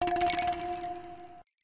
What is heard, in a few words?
pointer